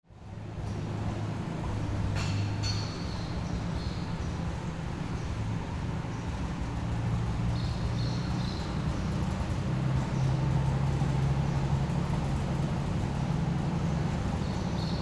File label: airline hanger